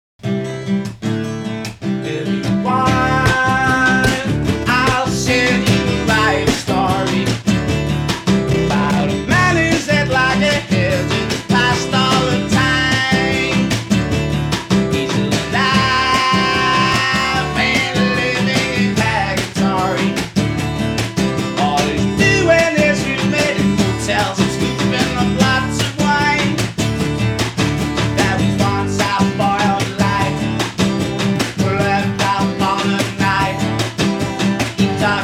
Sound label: acoustic; manchester key 103 may 1989